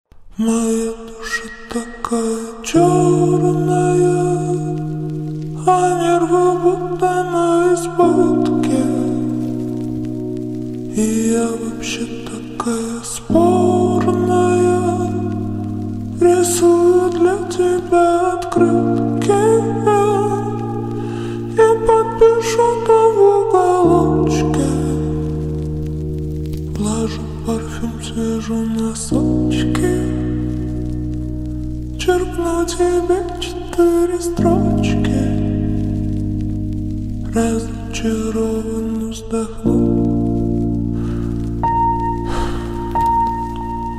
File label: slowed reverb